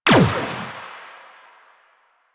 Shot Fire; Warframe; Akarius Prime

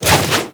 Flip Whoosh; Enemies; Foley; Warframe; Teshin; DE

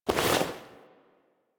Hand Stung Pullback Foley; Warframe; Sacrifice; Sacrifice Lotus Helmet

